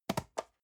storage fall table soft, box; objekte

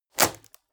wood break short; foerster

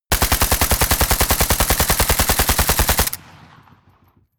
rifle ak-47 exterior fire automatic close; schusswaffen

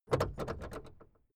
car camaro open trunklid short

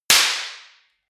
party popper; explosionen

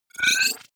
wobble rise short

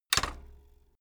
bicycle kick stand swing out

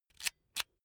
electric drill template slide in slow; maschinen; bohrer; soundpool

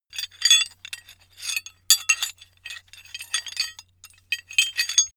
metal handling pieces sequence; atmosphaeren umwelt; baustelle

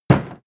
impact dull metal damped; soundpool

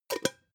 pot lid close; objekte; topf

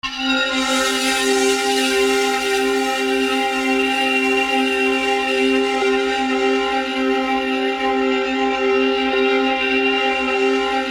rough harmonized

